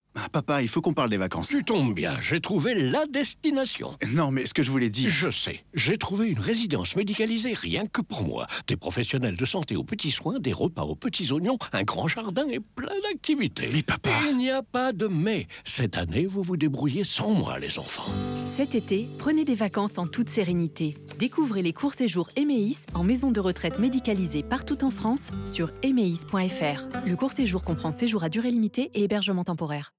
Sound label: Music; HAVAS; EMEIS